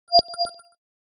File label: Futuristic positive accent